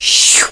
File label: schoop up